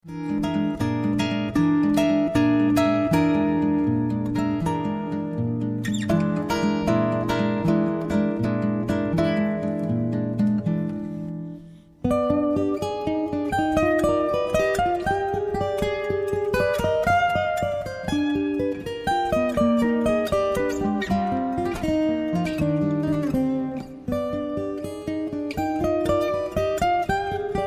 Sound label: etude, guitar